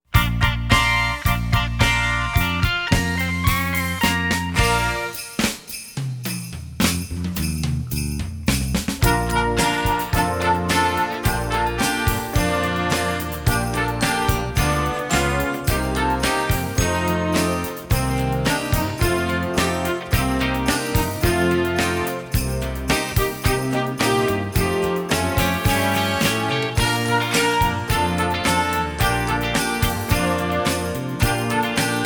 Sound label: band